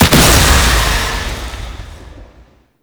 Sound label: Void Fissure Close; Warframe; Entrati; Conquest